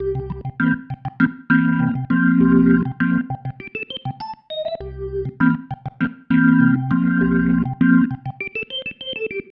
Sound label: Pattern, Organ